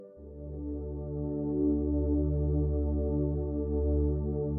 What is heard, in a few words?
Samsung ATIV S Neo Ringtone; Java